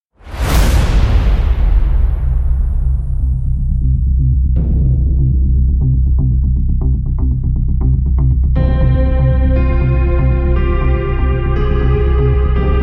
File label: full instrumen; blackout